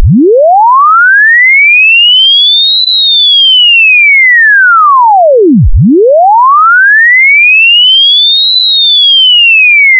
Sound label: chirping